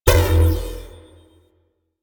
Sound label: sphere small power on; ambience; Warframe; Entrati